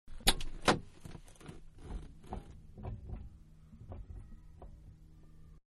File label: Foreign Car Door Open Interior; Vehicles; Car Foreign-Door Open-Interior; Cars; Manhattan Production Music